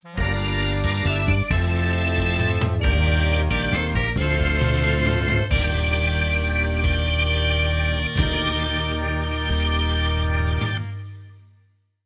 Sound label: Baseball - Lose; mario sports superstars 2017 3ds gamerip